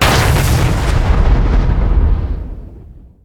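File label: bomb explosion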